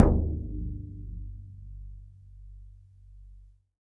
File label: Bodhran, Ireland